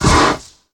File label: Monster Effort Big; Monster Ralph Effort Big